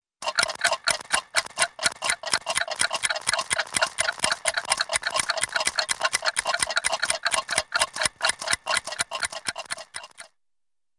Several Different Rapid Clocks Ticking At Different; Hollywood Edge; Cartoon Trax